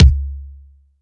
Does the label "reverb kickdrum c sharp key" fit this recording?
Yes